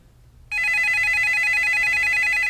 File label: ring; ijihad